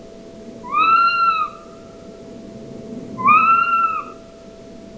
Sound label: Another Saw Whet Alarm Call